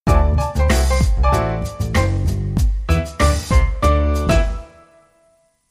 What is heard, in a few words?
Acoustic Funk Sting